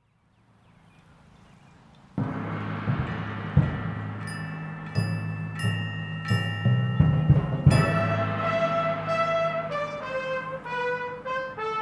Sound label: Fanfare for Band